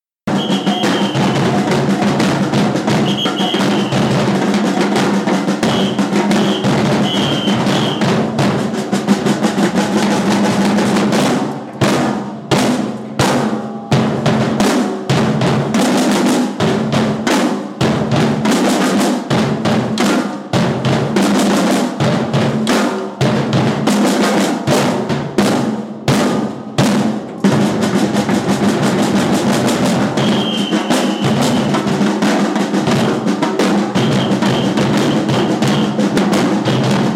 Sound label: samba Crescendo Break